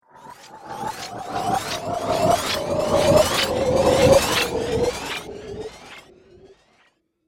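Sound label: Sci-fi Reverse Laser Delay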